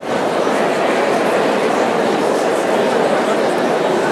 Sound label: gallery